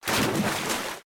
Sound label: water splash; Ben 10 - Tomb Of Doom